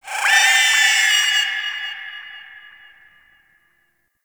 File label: Avichaea Vocal Captured Lift Away; Hunting Critters